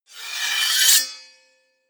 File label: scrape